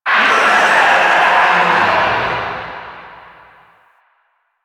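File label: Infested Distant; Warframe; NineteenNinetyNine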